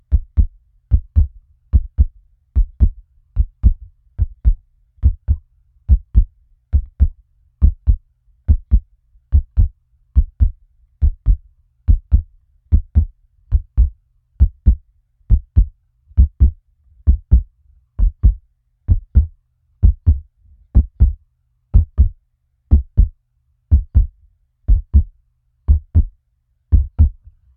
Heartbeat Slowing Medium

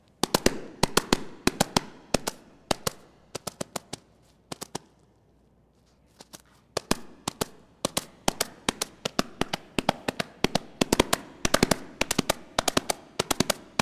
Horse Galloping Slow Down And Startup

